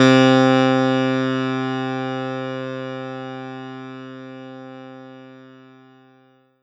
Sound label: Kawai K3 Bright Piano C3